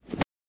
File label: fighting air movement; soundscrate